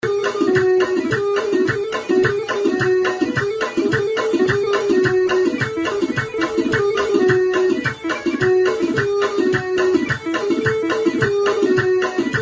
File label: Lianic, ساز